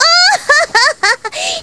ohohohoho

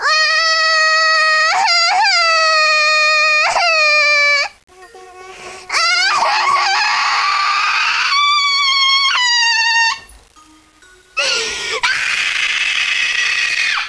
cry

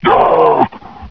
pain

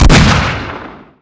barrel, weapons

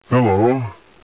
alert

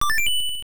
pick; touhou

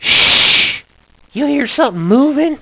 sssshhhh; hoss

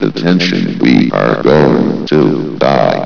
run

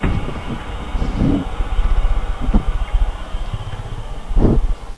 lever

